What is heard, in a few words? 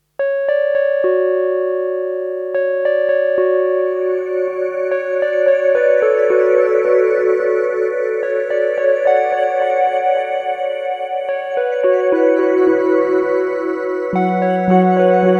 ICE 2 Okt Synth; Strymon Time Line